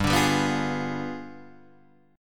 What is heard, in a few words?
G 7th Flat 9th, Guitar, Standard